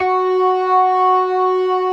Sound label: ORGAN, C3 PERC; Keith Emerson Signature Series